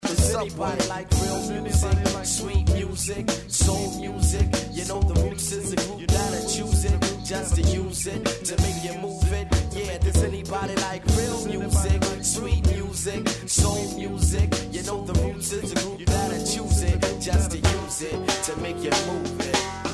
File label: good music hook